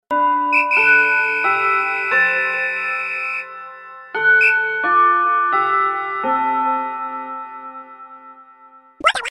about to end; pikmin 3

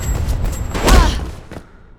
door bash; mirrors edge

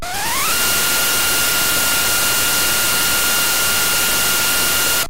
inhale; kirby s dream land

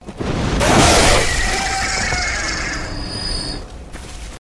crow death

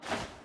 jump clean; hollow knight

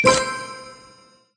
brick 3 in a row, Erscheinen neuer Spielsteine; candy crush saga online

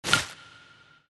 brick spawning new candy; candy crush saga online

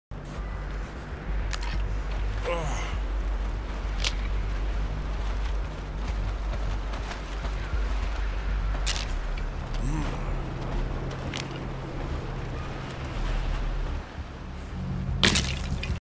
totem; Dead by Daylight

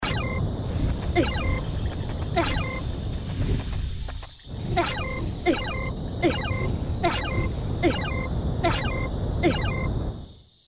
damage; Pikmin 3; alph; gamesoundopedia

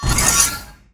portal button up; portal 2

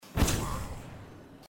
getting hit once; hyper light drifter

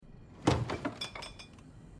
opening fridge sound; Life is Strange 2; gamesoundopedia

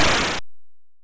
player get shit; 8 eyes

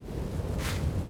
window; rome total war

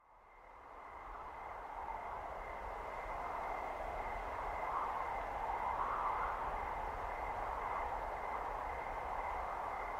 night; firewatch

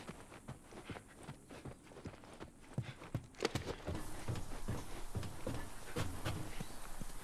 environment sound inside to outdoor

